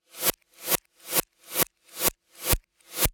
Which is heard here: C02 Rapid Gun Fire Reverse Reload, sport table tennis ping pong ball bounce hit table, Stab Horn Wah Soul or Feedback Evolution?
C02 Rapid Gun Fire Reverse Reload